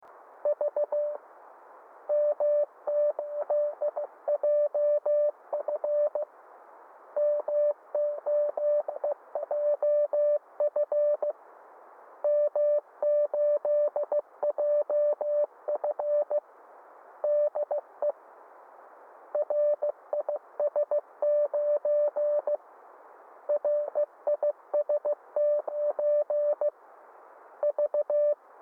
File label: 4870 kHz